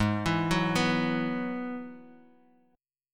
G sharp Minor 7th Sharp 5th, Guitar Open D; 6,7,4,5,x,x

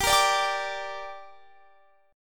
G Minor G, Guitar, Standard; strummed; JGuitar

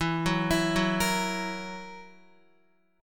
E Suspended 2nd Flat 5th, Guitar; x,7,6,9,6,6; 4F,4A,5C,5G,5C,6E; arpeggiated; JGuitar

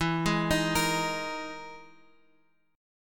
E Major 7th Flat 5th Guitar Open F; x,x,4,3,6,5; arpeggiated

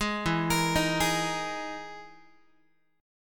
E Major Sharp 11th E down Guitar; arpeggiated; 11,12,13,13,12,x

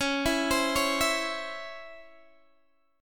Db Minor Major 7th Db Guitar DADGAD

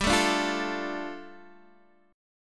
G 7th Suspended 2nd, Guitar Open D

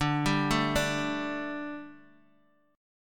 D Suspended 4th D

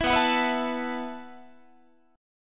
B 7th Suspended 4th B down Guitar

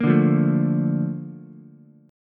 A sharp Major 7th, Ukulele; A#M7; strummed; JGuitar

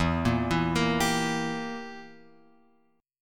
E Diminished E; Edim Chord {0 1 2 3 X 3} arpeggiated

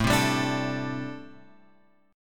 A Minor 11th A, Chord; Am11 Chord {5 3 5 X 3 5} strummed

